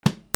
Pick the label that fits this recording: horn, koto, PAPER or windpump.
PAPER